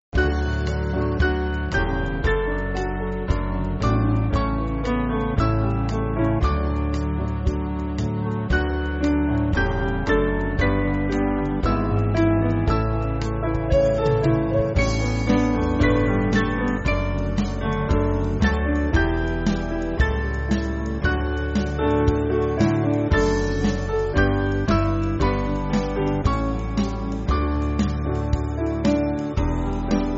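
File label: Band, Music Backing; Dear Lord How Precious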